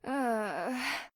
"ugh"

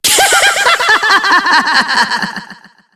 laugh; adachi

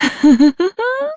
giggle

